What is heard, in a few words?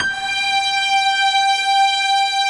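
piano strings, G5; Best Service; Advanced Media Trax Modern Composer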